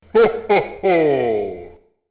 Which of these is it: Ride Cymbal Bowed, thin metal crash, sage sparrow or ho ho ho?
ho ho ho